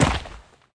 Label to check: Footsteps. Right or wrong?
Right